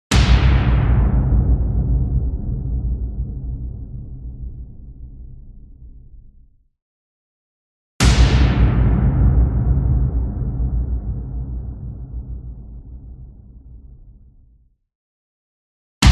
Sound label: CINEMATIC HIT Fire Boom; smartsound